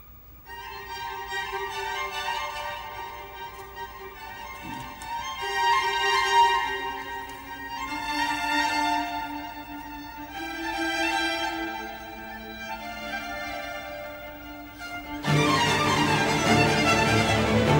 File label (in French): Violon; Les 4 Saisons (l’Eté; de Vivaldi